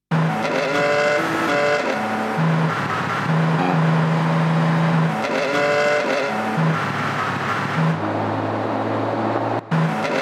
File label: Theme Tune With Engines; Top Gear